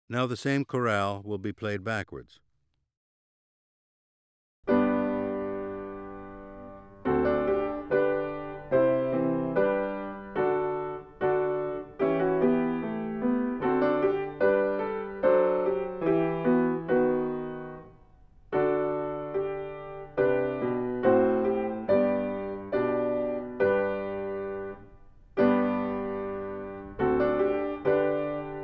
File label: effect of tone envelope on timbre